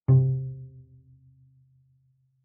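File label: Bass pizzicato fortissimo sul D C3, Double Bass; MIS Pitches - 2014; Strings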